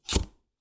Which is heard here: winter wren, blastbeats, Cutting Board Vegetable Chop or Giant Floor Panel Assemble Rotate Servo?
Cutting Board Vegetable Chop